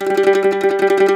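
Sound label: MANDOLIN TREMOLO, Plucked Strings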